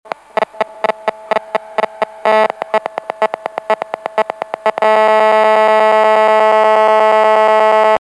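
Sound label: mobile phone connection; code handy connection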